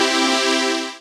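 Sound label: synth pad; Best Service Gigapack 1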